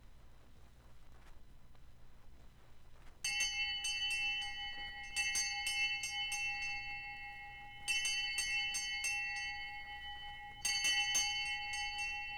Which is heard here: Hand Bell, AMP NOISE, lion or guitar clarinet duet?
Hand Bell